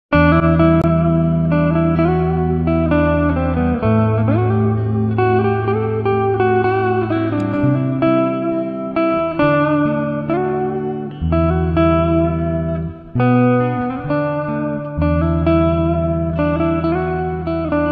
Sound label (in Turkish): elektro gitar